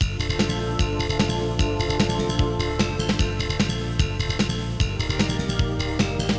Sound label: Character Select Music; breakers collection; ps4 ps5 switch windows xbox one xbox series xs